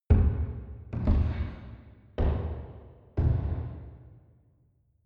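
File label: step church movement, footsteps; menschen; schritte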